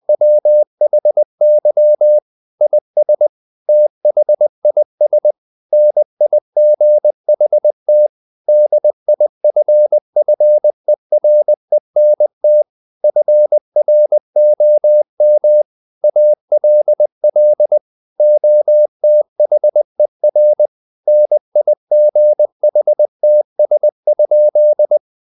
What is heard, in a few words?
intro cw